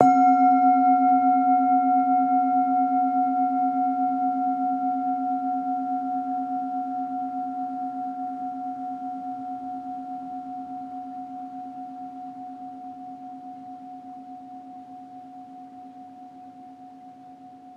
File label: bowl medium C3 mf; healing soundscapes